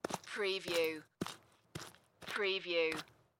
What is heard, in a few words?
Footstep Mud Walk